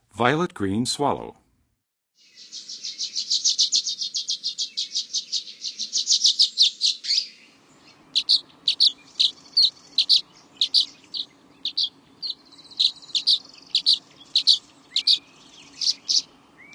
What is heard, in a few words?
Violet-Green Swallow, Western USA Songbirds; North America